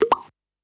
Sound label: pop; eddyflux